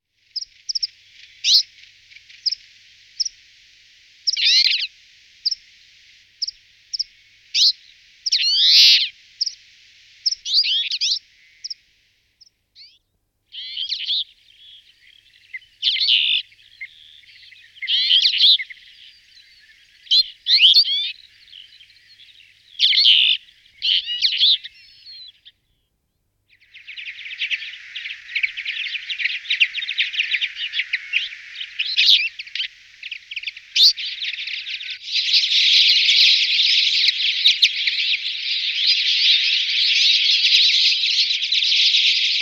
Konopka zlutozoba Carduelis flavirostris

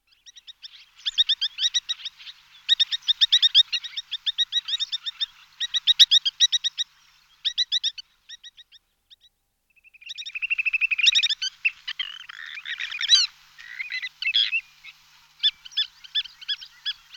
Cejka trnita Vanellus spinosus

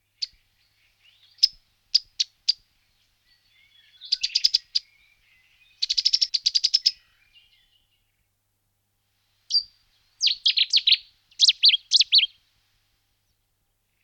Cestie jizni Cettia cetti, nasiptaci

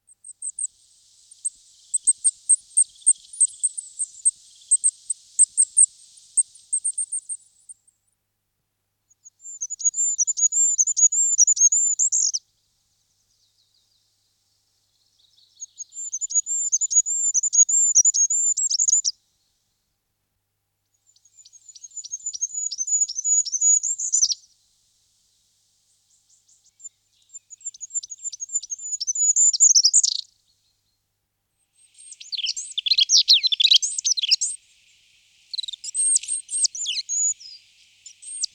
Kralicek obecny Regulus regulus

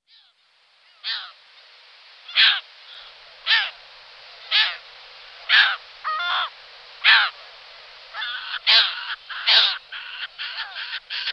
Racek Audouinuv Larus audouinii, nasiptaci

